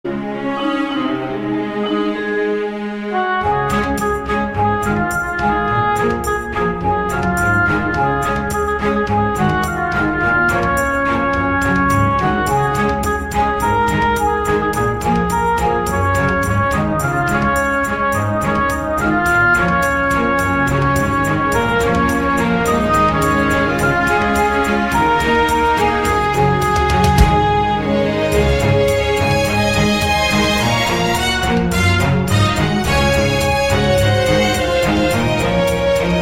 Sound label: Orchestral Remix